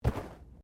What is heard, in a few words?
Body movement Slide